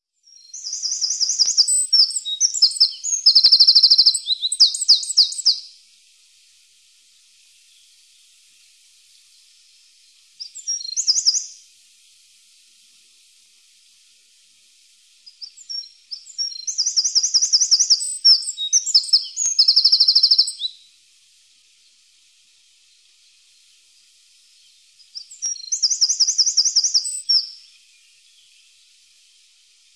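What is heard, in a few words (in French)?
Troglodyte mignon, oiseaux; birds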